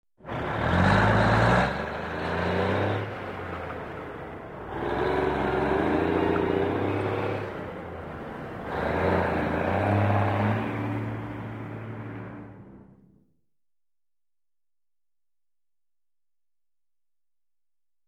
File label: Tractor Running